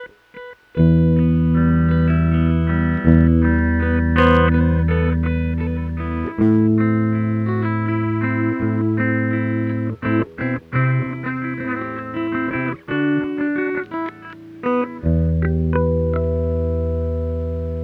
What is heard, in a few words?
sansamp tweed clean classic